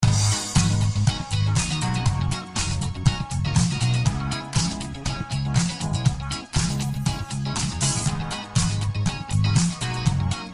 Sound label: bgm; FingerGuessingMaster